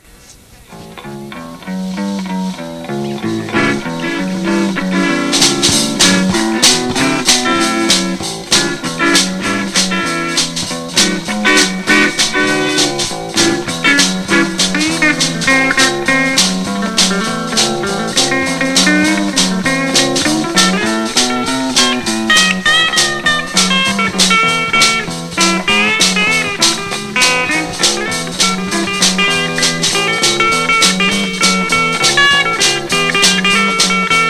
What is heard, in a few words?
Small Band Blues